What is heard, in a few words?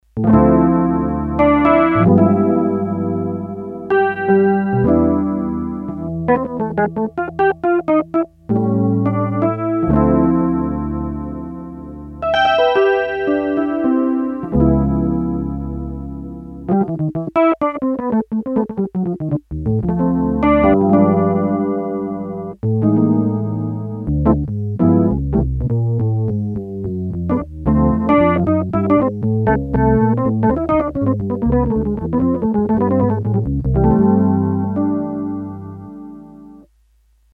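Jazz Guitar, Korg DS-8; Factory Patches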